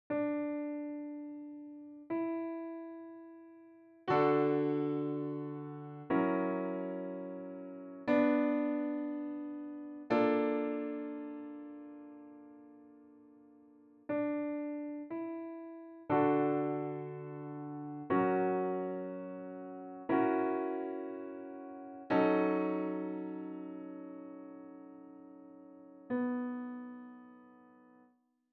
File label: D Major piano sound; ISOLATED TROUBLE SPOTS